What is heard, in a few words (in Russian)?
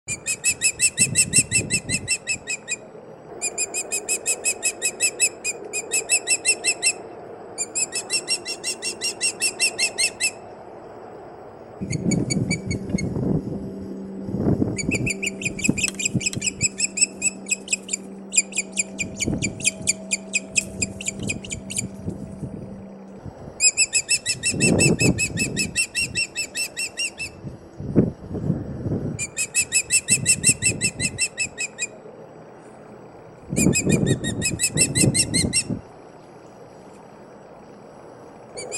Skopa